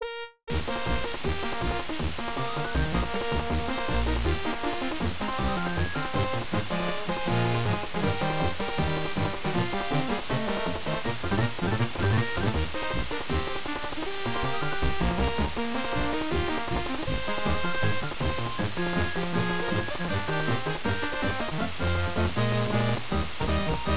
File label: Team Jingle; Dick Vitale's Awesome Baby College Hoops; Genesis